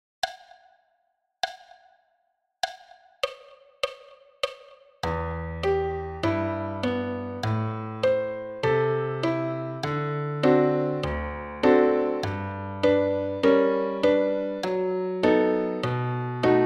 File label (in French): piano solo a 60 bpm